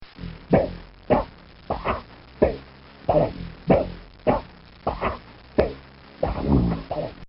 Cartoon Sound Effects Plops

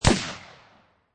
Machine Gun Ak 47 Single Shot; Guns

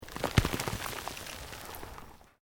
Earth Loose Move Short

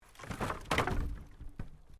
Wood Planks Fall

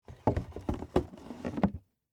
Game Box Lid, Board, Close; Sports; Board Games (Sound Effects:Games)

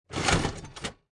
Slot Machine Handle Pull; Casino, gambling, Slot Machine. Pull handle.

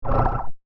Deep Laser Portal Closes

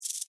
Insect Rattle Shake, Bug; Animal; Insect Movement Sound Effects

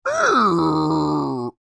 Creature Moan Upset, Human; Groaning Sound Effects; Voice; BigRoomSound

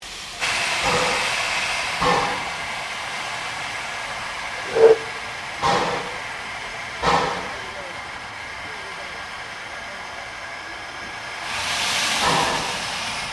Train NA Class Pass Slow, Steam train; PUFFING BILLY 1908; Melbournes classic Puffing Billy Steam train; Trains

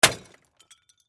Impact Automobile Headlight, Smash, Shatter

